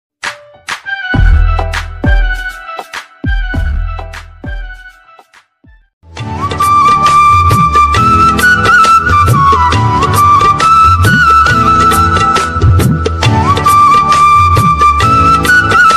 instrumental ringtone

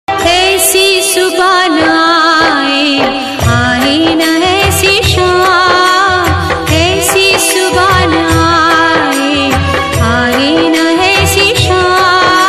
Shiv bhajan ringtone

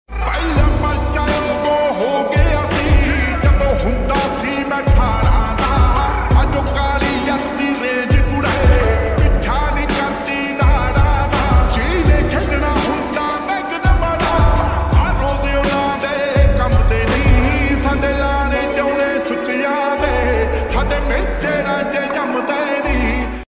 song ringtone

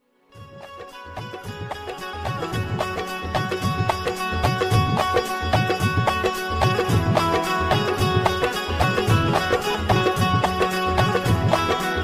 mast song music tone ringtone

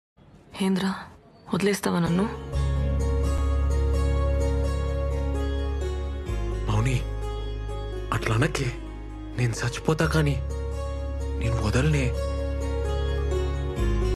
telugu emotional love

